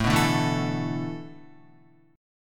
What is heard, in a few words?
A Suspended 2nd Suspended 4th down Guitar; Asus2sus4 strummed